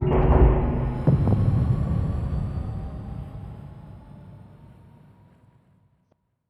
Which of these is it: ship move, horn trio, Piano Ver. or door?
ship move